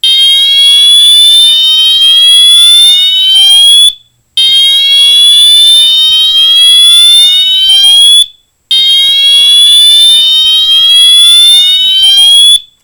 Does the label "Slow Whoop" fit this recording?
Yes